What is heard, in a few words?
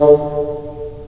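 wind bassoon; LA Composer vol. 3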